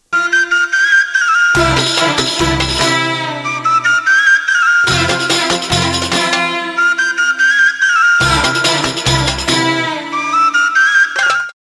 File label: flute